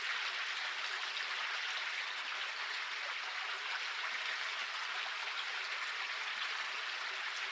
waterway, ambiance; Keepsake Demo

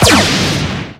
gun turret fire; darwinia demo

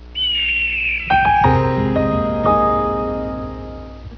Soft Eagle Chime

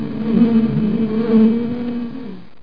swarm; landslor

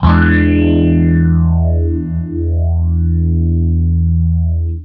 Clean Phase E7, guitar, clean phaser, chords; Guitar Special; Future Music

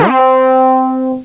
BLIP

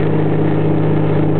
p47 engine; Air Warrior Demo; Jeep

